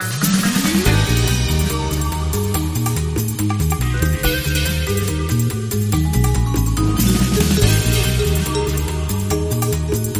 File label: Underscore Vibes